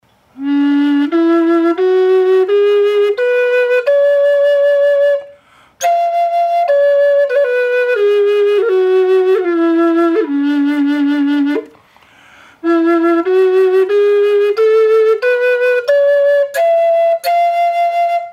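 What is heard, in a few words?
D minor dry, flute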